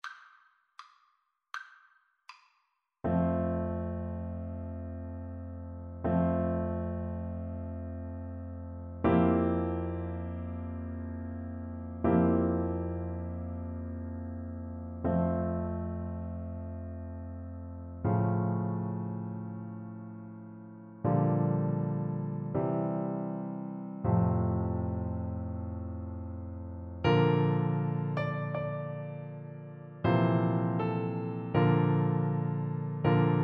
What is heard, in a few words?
Oboe; dvorak largo